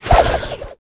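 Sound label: axe throw; gimli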